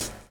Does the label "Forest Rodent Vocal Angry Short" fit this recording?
No